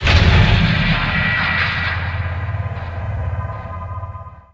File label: button